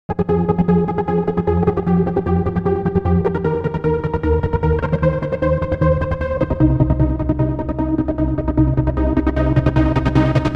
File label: Dance Arp